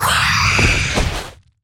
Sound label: devil fairy death; ubaid